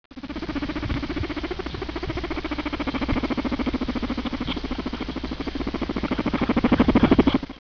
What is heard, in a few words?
purr; Groundhog purring at Spice